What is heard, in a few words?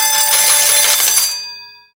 Cash Large